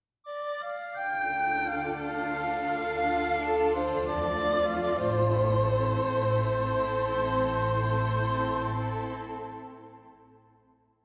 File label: Classic nostalgic longing orchestrated flute